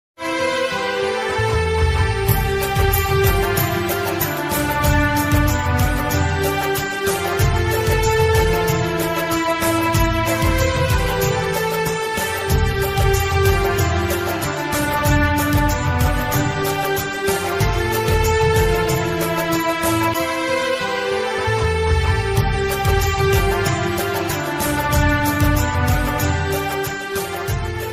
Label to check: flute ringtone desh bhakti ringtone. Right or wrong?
Right